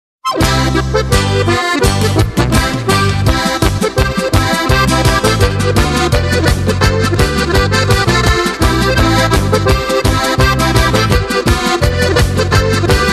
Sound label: groove